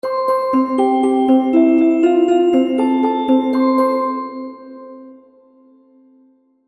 Happy Baby Game Music; Cartoon Music

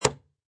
Sports Darts Impact Board; Thud Sound Effects; Objects; Lynne Music

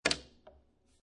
Billiard Pool Snooker Mishit Cue Mis Strikes Ball; Sports

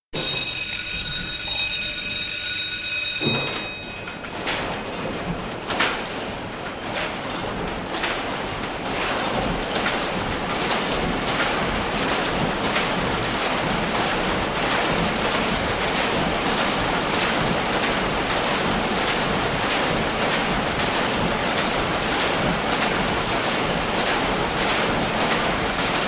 Cosser letterpress, start, run & stop; 1935 onward; Miscellaneous Office; BBC

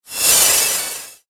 Swish Glassy Whoosh; Andrew Fleming

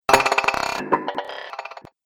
Droid Metal Rattle, Robot Sound Effects

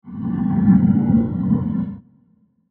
Scary Deep Transition; Scary Soundscapes; Horror; Crafted Signals

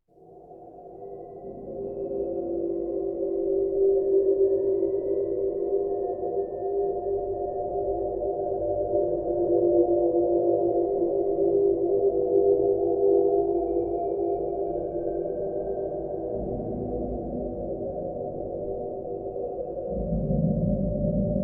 Drone Scifi Trailer Tonal Outer Space Ambience; Airy Atmosphere; Horror Science Fiction

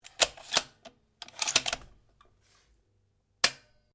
Rifle Remmington 700 7.62 mm Bolt Action Dry Fire; A selection of domesttic firearms; Rifles

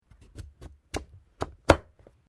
PUMPKIN CUT; Various food preparation; Punch And Hit Sound Effects; Punches and Hits (Sound Effects:Combat)

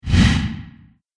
Fast Deep Whoosh; Whoosh Sound Effects; sound design

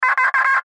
Radio Morse Code; Weak Signal Letter Q; Warfare; Blastwave

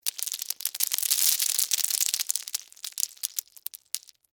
Debris Lima Beans; Texture; Materials

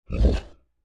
Creature Large Grunt Effort Huff; Monsters; Horror; Big Room Sound

